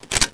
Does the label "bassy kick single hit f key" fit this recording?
No